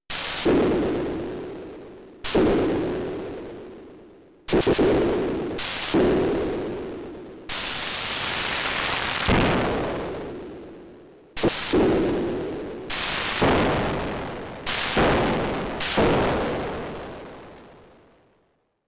Studio Electronics STE.16 Dual LFO als Noise Generaotr

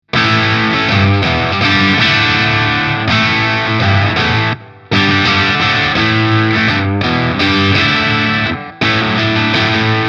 Steg Singlecoil verzerrt; GL Tribute Asat Deluxe

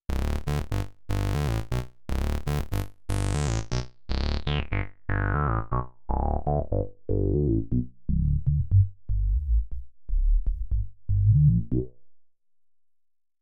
Studio Electronics Tonestar 2600 Oszillator Mix Filter Res 50

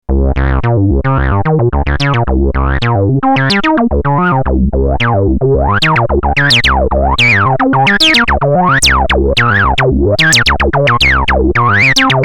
Sequenz

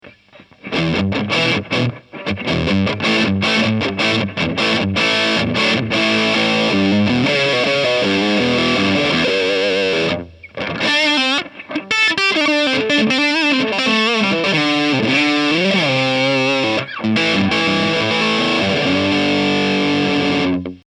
verzerrt Steg

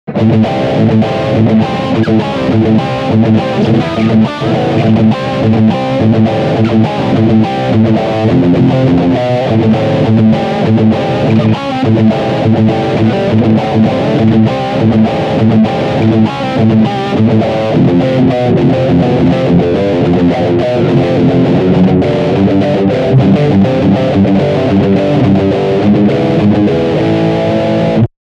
Riff Steg humbucker

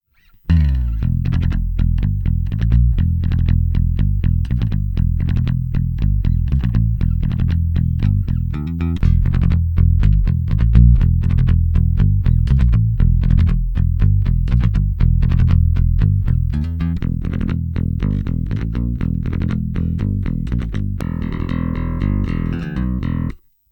Pick PU Mittig Vorn Hinten EQ BS High Voll; Toby

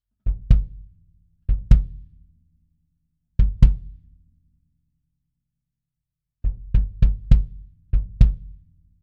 Kick mehr bass nur dyn

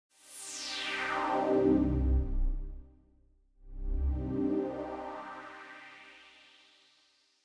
Waldorf Filter Resonanz

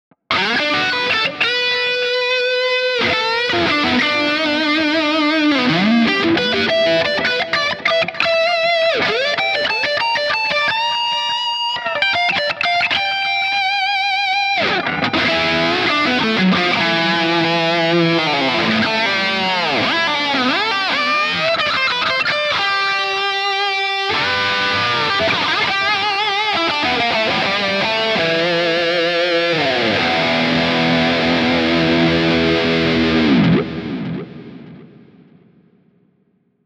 Gitarren Plug-ins im Vergleich Bias FX 2 Highgain Lead

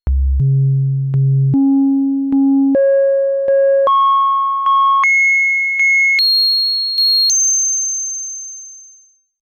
Selbstresonanz 4075

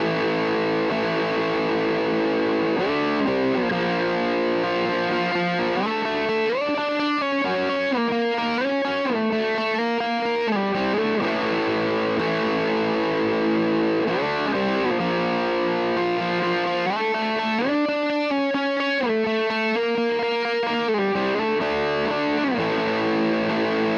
MH 1000 Zerre; ESP LTD Deluxe

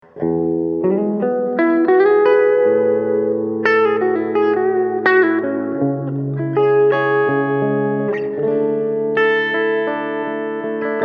Clean Hall

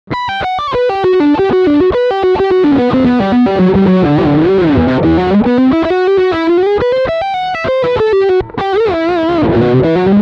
Lick Distortion Steghumbuck

